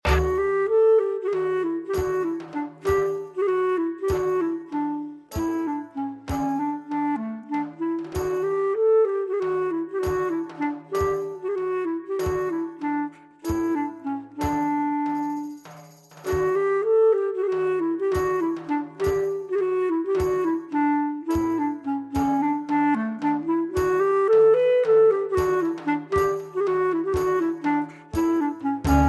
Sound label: A Balkan Dance